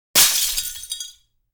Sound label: glass small window; Asian Show Sound Effects